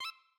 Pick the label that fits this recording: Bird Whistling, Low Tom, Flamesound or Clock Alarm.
Clock Alarm